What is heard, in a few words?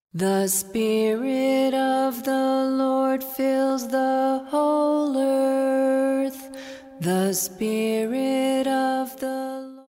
Early Christian Chant